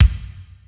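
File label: digi Bassdrum; Drums; Polyend; Legowelt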